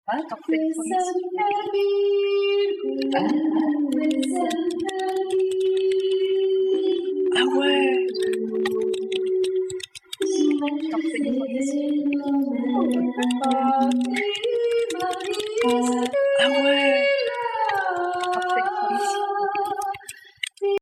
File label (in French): extract voix de sirenes